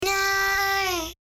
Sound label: vocal